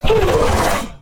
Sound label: hurt, she; combat; creatures; ryuchi; CosmicRage